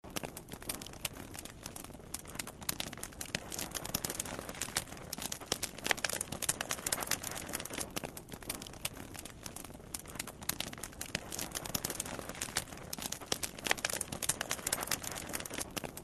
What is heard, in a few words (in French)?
Ambiance feu; Blind Survivor